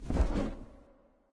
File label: wingflap; smite; thanatos